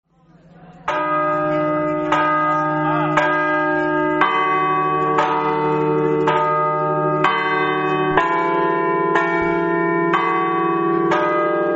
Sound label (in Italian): campane